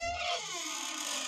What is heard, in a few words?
Mega Shift Loop; Halloween